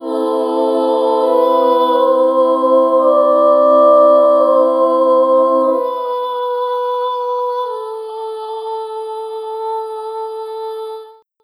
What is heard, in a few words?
Vocal Choir 2 Solo